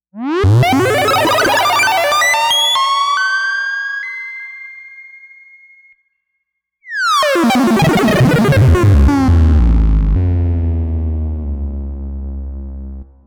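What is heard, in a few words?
MOOG MAVIS REW FF with Stereo Plate Reverb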